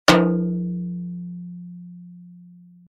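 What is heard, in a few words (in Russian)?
удар по металлу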